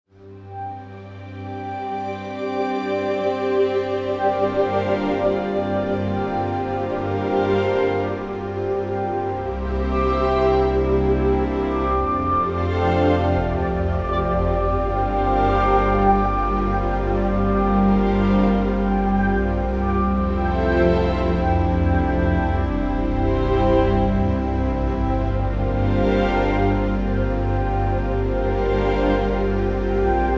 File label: over water